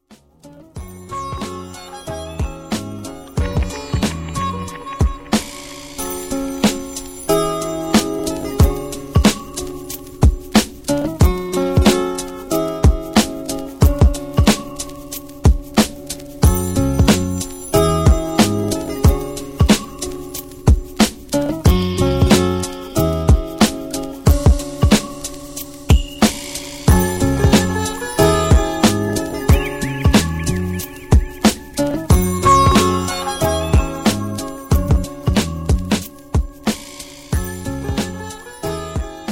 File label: desert music, perc